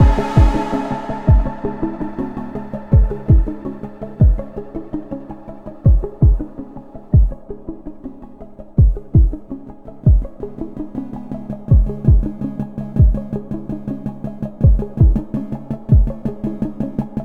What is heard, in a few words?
Hacking Loop 164 Bpm